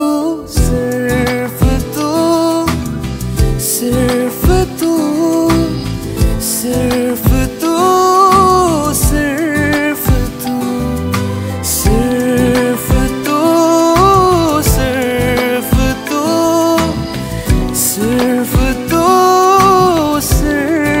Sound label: song ringtone